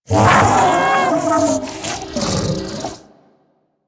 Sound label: Pain, Infested; Enemies; Barks; Warframe; Ancient Shell Agent